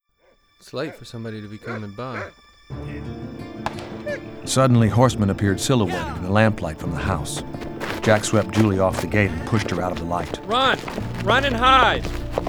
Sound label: Bar Barn Attack